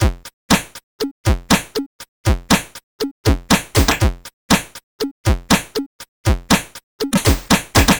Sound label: C64 Beat, chiptune drums; Commodore 64